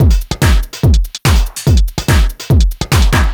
Dance Drumloop; Drums; Dance Beats

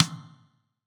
acoustic drums

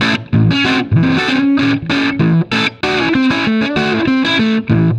Electric Guitar, Loops; Guitars

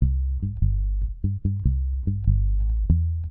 Electric Bass; Basses

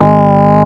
SYNTH; SoundFactory

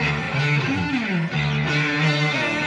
Processed Guitar, Loops; Guitars

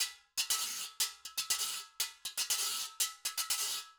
Acoustic Percussion

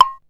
DUB, DRUMS; SoundFactory; SMPMANIA

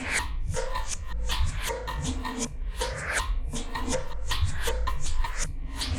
Electronica Drumloop; Drums

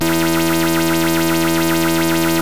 beep lead, yamaha rm1x synth

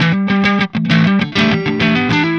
Electric Guitar, Loops; Guitars

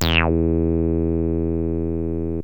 bl saw, yamaha rm1x synth bass and lead

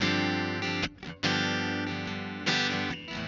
Acoustic Guitar; Guitars

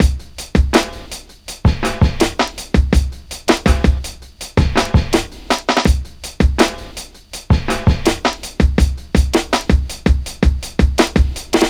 Old School Break, Drums

